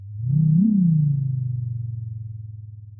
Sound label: Sound of an owl